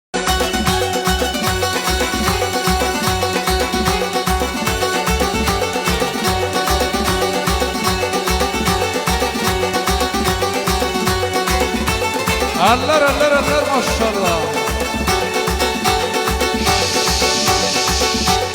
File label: Yanıq Kərəm Azari Instrumental